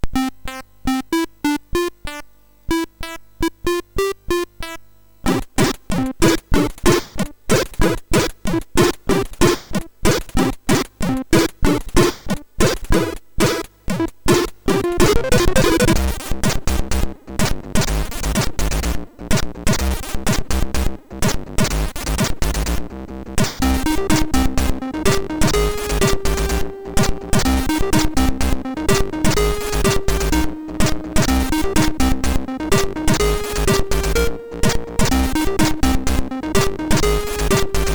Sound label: Happy bday, MOS6581R4; sid